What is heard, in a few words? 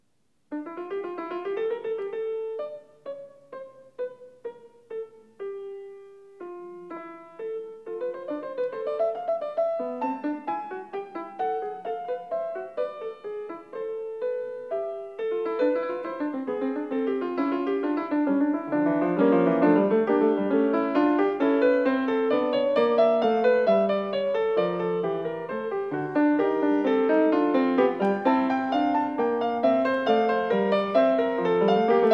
Fugue No. 6 in D minor, Bach, Well-Tempered Clavier; BWV875